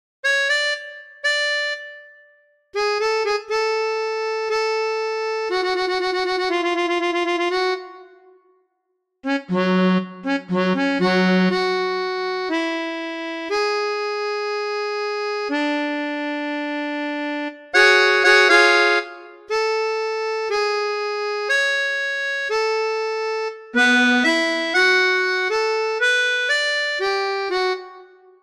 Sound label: tango, melodica